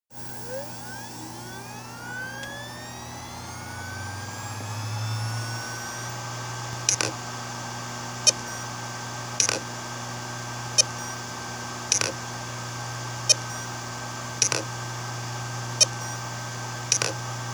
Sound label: Seagate 500GB 7200.10